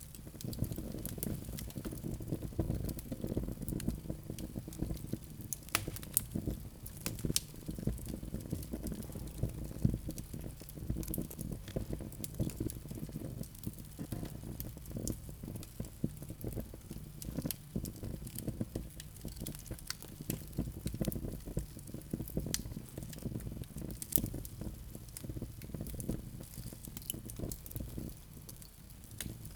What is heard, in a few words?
Gentle Fire Loop; cliffs-interior-bandit